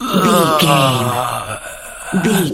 voice male death

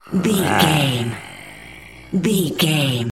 zombie voice groan

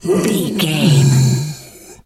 bear pain whimper

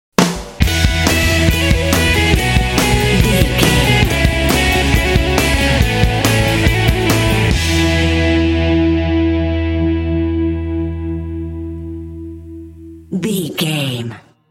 pumpin indie alternative rock energy sting

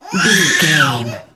voice baby cry funny scream

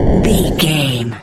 retro explosion bass

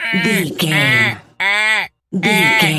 seagul squawk deep

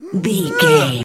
voice male effort grunt long

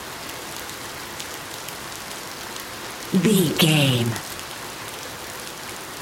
rain medium loop

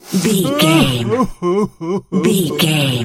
voice male cry low

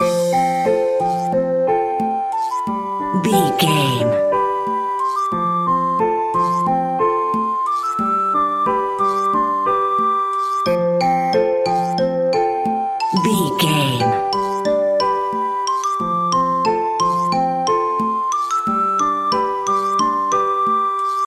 music kids play loop

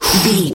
voice male breath single

